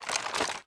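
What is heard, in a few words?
inspect movement; Valorant; cstrike; bucky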